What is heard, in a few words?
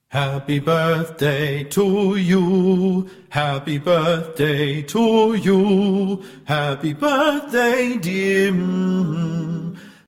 Happy Bday Fast